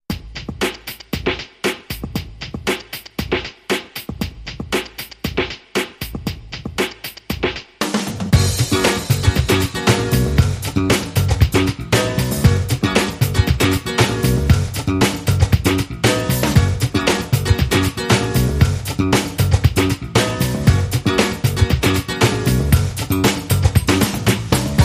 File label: No SFX No Vocals No Slide Guitar Mix, Breaks